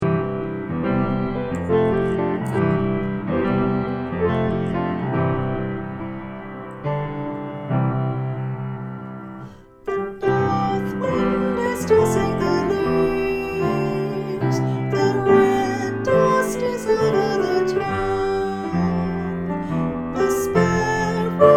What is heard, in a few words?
Christmas Day soprano; Words by JOHN WHEELER; WILLIAM G. JAMES